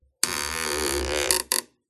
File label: Stress Creak Squeak Groan; PMSFX